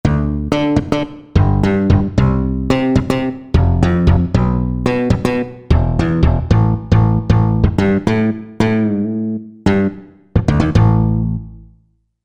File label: Tight Bass, Korg MSC-14S; Korg MSC-14S Sound Effects